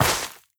step, footsteps player grass

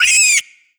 pain, headcrab, creatures; headcrab classic; vox

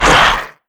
grunt, antlion; creatures; vox

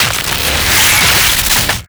shockwave rise

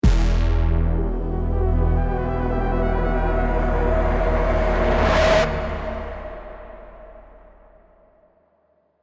deathcam

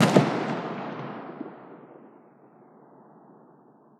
scar20 distant, weapons; Counter Strike Model Pack; CS2

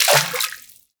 fs fm water, footsteps; Portal 2

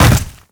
land, footsteps, player, mud

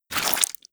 debris flesh, bullet impact debris

